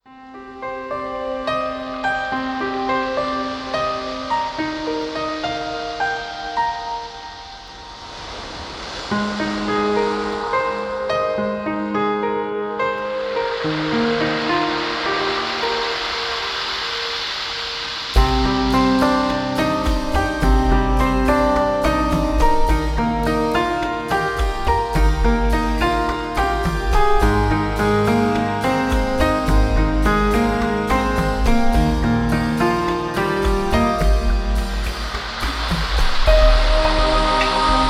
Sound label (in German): Entspannungszeit Melodien der Natur, Gefühlvolle Entspannungsmusik